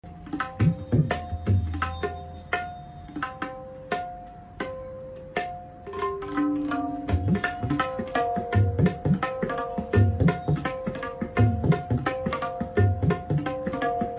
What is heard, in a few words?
Harmonious tabla groove